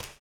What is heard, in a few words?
STOMPS; Spectrasonics; Vocal Planet; Blues Soul